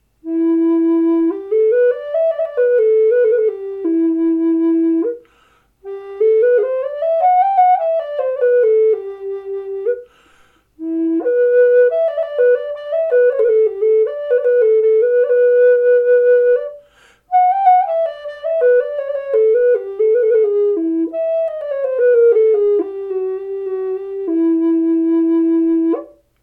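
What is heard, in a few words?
E4 Black Limba, Native Style Flutes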